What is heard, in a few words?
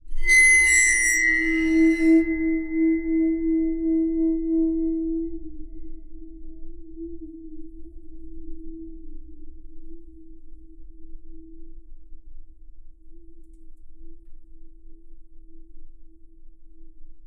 Waterphone Bowed Contact Mic; The Sound Catcher; Liquid Metal